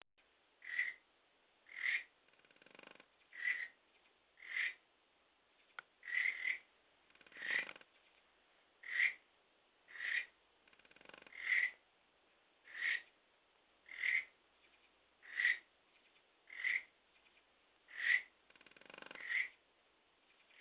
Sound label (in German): Vestria vibration u. stridulation leider von Mecopoda überdeckt